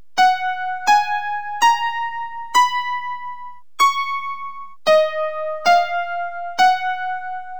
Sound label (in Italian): SCALA IN FA